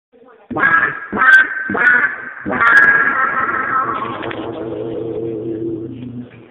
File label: wahwahwah; ringtones; sms